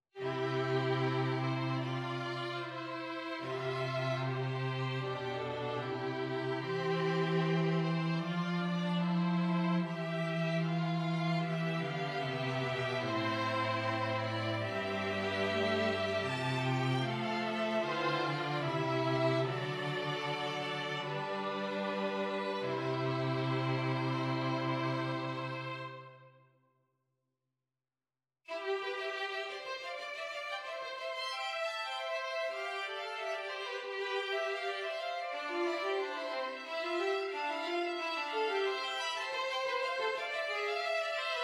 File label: string quartet counterpoint practice